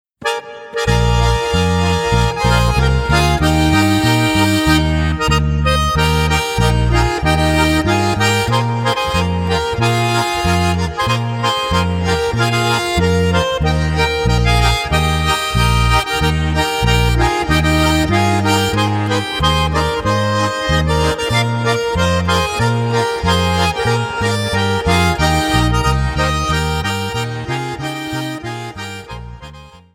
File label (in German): Schlager